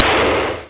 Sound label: tank; battalion